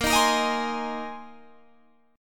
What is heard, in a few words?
Bb 7th Suspended 2nd Suspended 4th; strummed; 18,18,20,20,21,20; Bb7sus2sus4